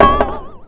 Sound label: jump